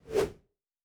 Whoosh; Fantasy Sounds Bundle

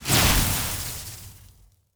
Lightning Spell, Magic Sounds; Fantasy Sounds Bundle

